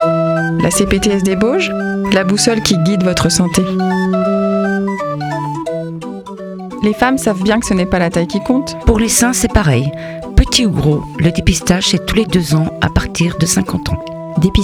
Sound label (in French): jingle octobre rose